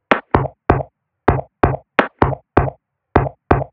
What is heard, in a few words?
perc 128, Electro percussion loops; Anthemic